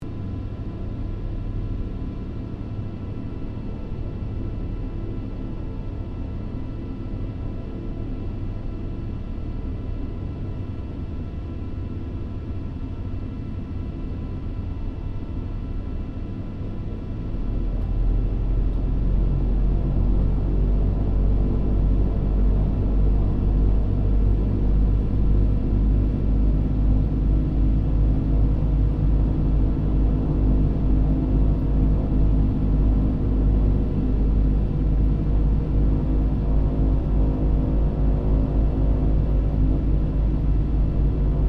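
AIRCRAFT LOCKHEED L-1049 SUPER CONSTELLATION PREFLIGHT INTERIOR; 1955; Connie, Super Constellation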